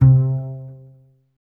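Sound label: string contrabass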